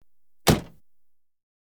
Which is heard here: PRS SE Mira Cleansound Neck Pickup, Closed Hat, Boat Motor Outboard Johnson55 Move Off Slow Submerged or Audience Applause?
Audience Applause